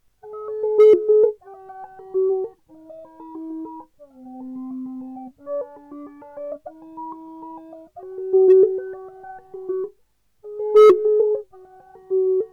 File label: step phaser